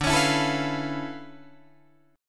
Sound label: D Minor Major 13th, down, Guitar, DADGAD